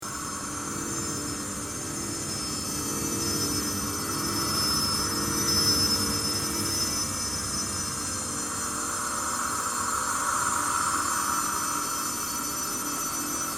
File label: Swelling drone